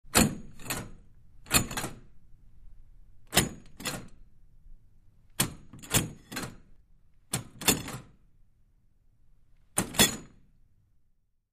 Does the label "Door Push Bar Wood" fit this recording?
Yes